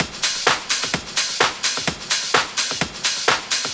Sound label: House Loop, 128 BPM; Vengeance Samples